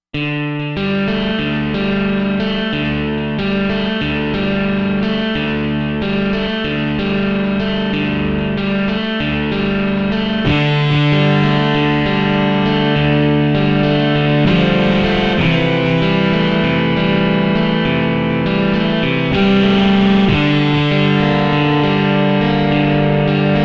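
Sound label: Guitar Two Amps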